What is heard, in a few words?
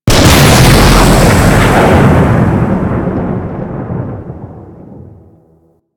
Archwing Space Explosion; Explosions; Warframe